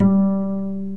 Plucked Bass G4, upright bass plucks

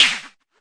Arturia MiniBrute sn

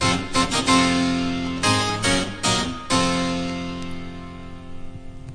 harpsichord intro; melodic samples; keys organ piano; anita

